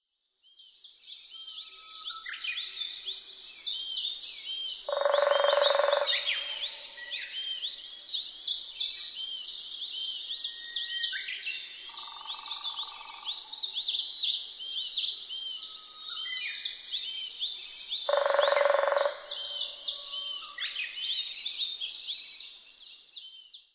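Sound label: kera sp, mole cricket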